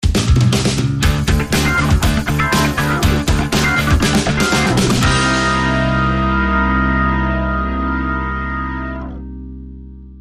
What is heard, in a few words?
Funky Organ Rock